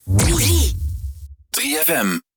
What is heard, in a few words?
Sweep